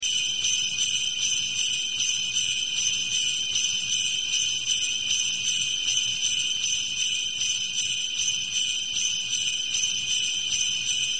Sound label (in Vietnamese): chuong xe truot tuyet; ong gia noel